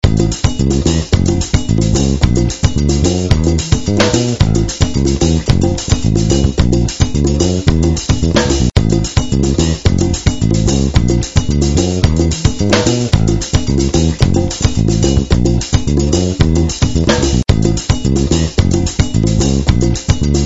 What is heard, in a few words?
Music